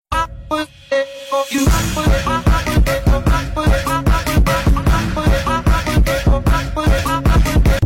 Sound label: papureeee